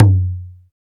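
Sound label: DUMBEK, Talking Drums; Roland - LA Composer vol. 1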